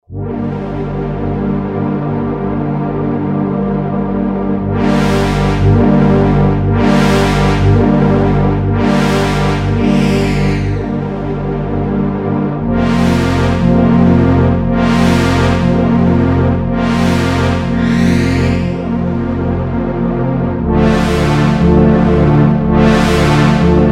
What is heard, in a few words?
Dark Brass Pad